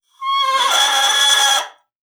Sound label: BASSOON; Xsample VOL-6